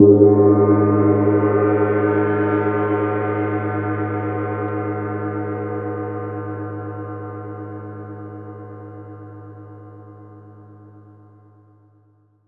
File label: perc g#, Percussion; Chillout (ambient1&2)